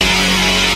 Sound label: fake metal, Guitar; Notes